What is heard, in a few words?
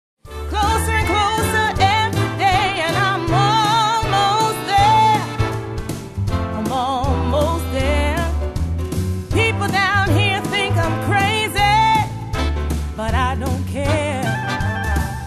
karaoke vocal; princess; disney